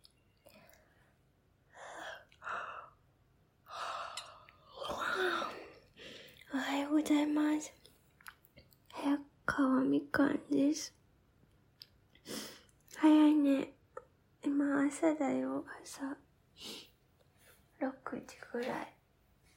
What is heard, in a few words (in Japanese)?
おしっこ実演 朝一番のおしっこ編; おしっこ録れるもん。